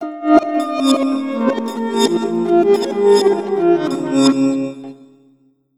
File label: STRINGS, REVERSE FX; USB Soundscan vol.60; Asian Traditions; AKAI